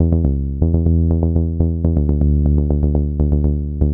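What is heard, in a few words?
122 BPM Bass Synth A major F minor Tonic D; Pro Bass Synth Studio Kit; MMB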